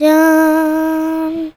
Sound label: CHILD, Indian Traditions; USB Soundscan vol.45; AKAI